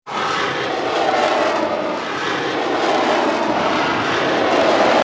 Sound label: Bullet Time; FXHome; Bullet and Gun